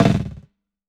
SNARE BUZZ

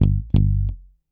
Mute Rhythm, Bass; Construction Kit; Riffs, Patterns Licks; Bass X

